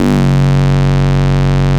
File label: SQ F#2, Korg; Back In Time Records; Korg Universe VOL-1; 800DV